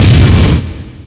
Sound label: Hit Punch